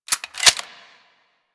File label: Clip In, Weapons; Warframe; Furis